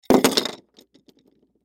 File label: Nunchaku Drop On Wood; Warfare; Miscellaneous Combat; Blastwave